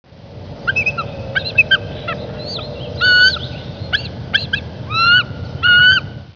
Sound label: bald eagle